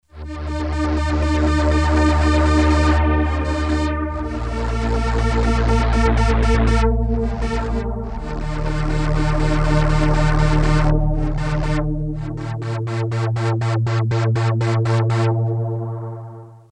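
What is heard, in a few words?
pad lfo, analog polysynth; Solton SM100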